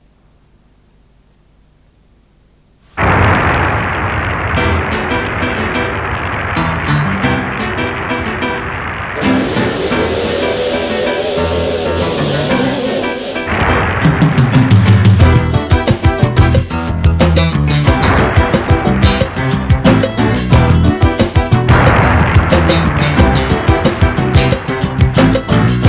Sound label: Instrumental